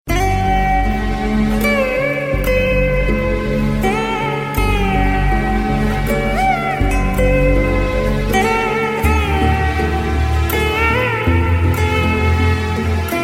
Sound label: Sweet Classical